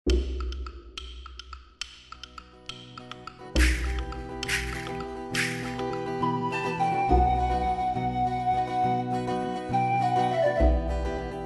spider loop

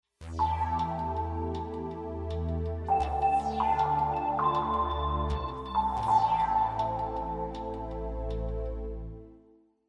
Bandit Splash

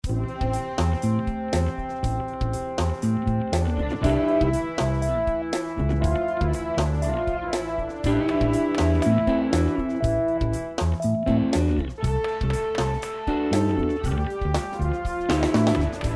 tower up loop

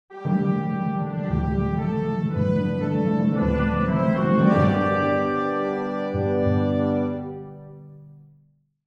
Tower Up Victory

